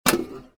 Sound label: open, stall door; movers; doors; Soldier Of Fortune II Double Helix; XBOX